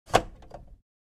Epson ET printer paper tray pull out

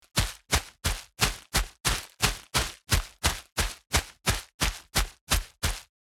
Footsteps on dirt sneakers running loopable

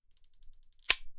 Rotating sprinkler cut garden outdoor

